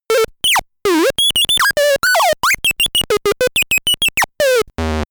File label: talking arcade